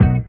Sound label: doowop, Notes, Guitar